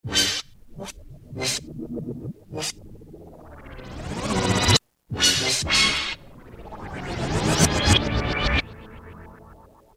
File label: Sonic Stabs; Roland M-DC1